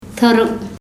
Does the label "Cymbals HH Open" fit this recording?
No